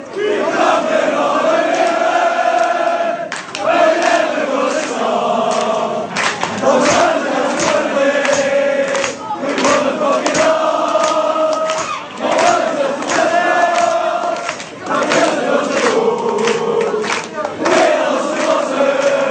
allez allez allez, fan chants

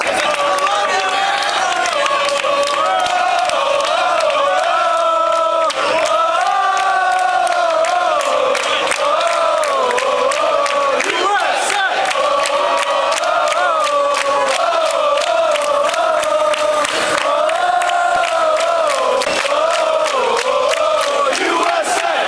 oh usa, chants; Uncle Sam's Army

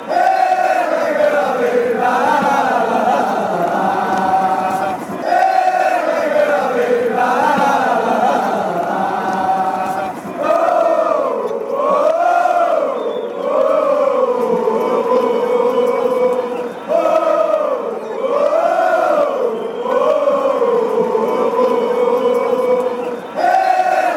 allez, fan chants; eh braga

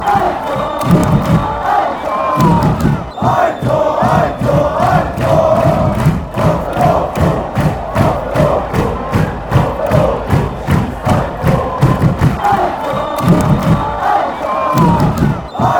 a goal, fan chants

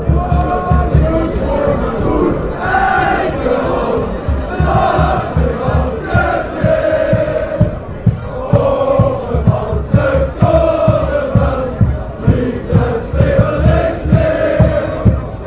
Limburgs folksong, fan chants

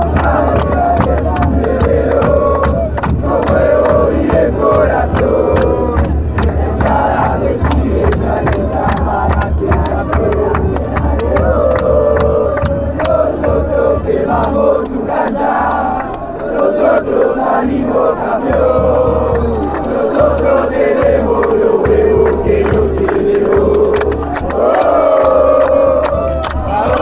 well burn you stadium, fan chants

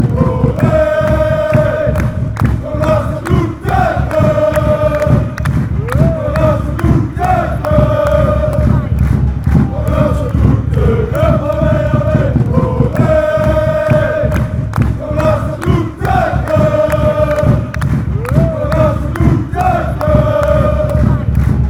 ole ole ole, fanchants

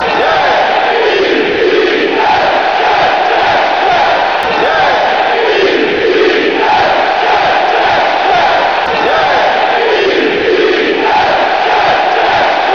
j e t s jets jets jets fan chants

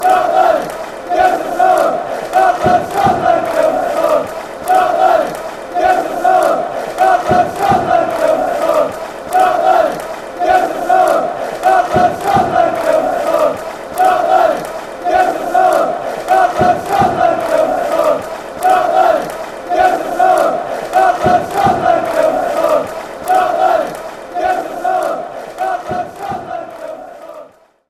give us song, chants; scotland

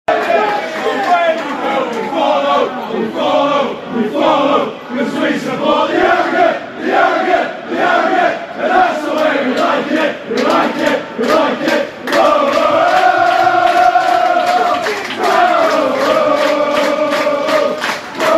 mental chant; harrowgate town; united kingdom

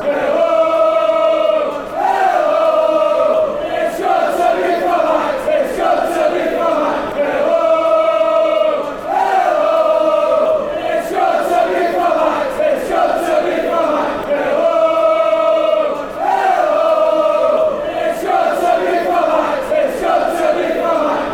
hello its good to be from fan chants

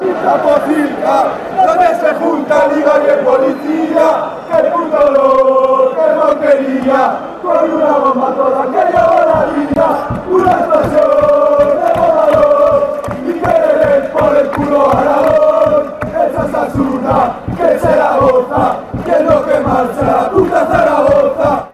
a passion, fan chants

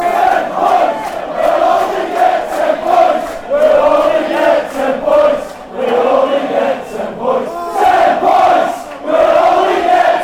fanchants; 10 points blackpool

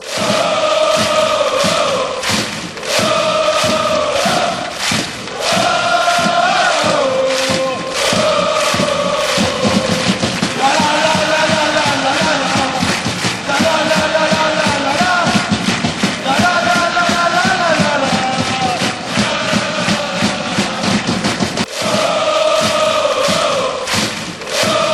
lolo lalala woah, fan chants

